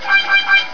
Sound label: buzzin